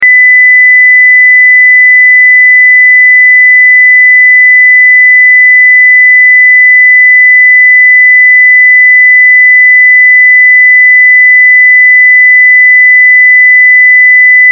Test Tone Square; Square Wave 2Khz 60 Secs 0Db Loop; Technology; Blastwave

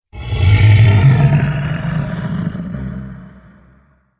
Dragon Big Thing, monster; horror

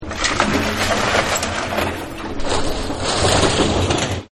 Tear It Apart, Smash; Objects; Partners In Rhyme

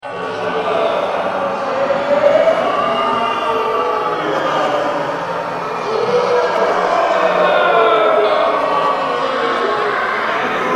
Dungeon Screams, Dungeon, Screams, Horror; 5.1; Sound Effects:Science Fiction; BlastWave

